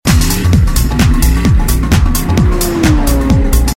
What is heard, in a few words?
Funny; Google; NewWave Labs